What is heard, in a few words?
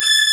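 Kurzweil D7, strings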